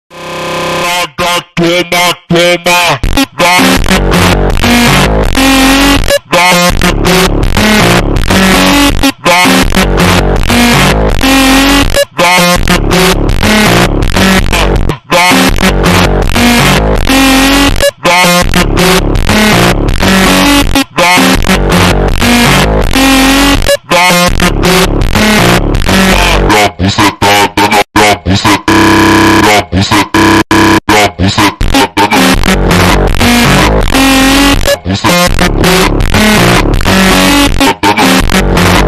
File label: ultra slowed reverb